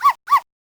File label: missile arf; TheChaseBuzzer